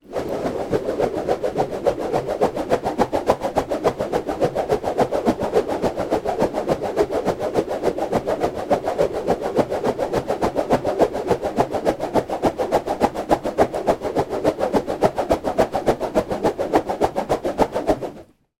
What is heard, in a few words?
foley rope lasso spin air med fast long; zapsplat